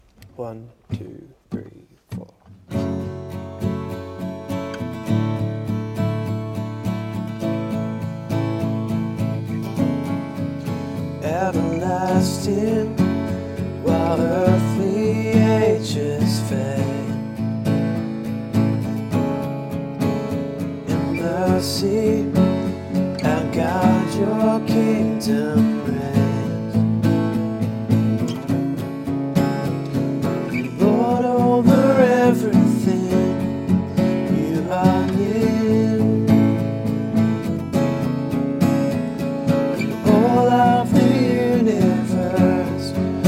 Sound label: acoustic